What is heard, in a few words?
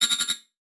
Multimedia Internet CD-Rom Flash Animation